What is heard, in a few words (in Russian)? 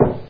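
одиночный глухой стук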